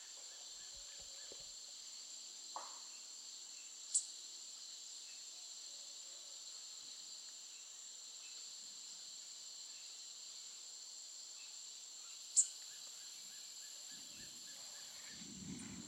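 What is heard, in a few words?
Purple honeycreeper, bird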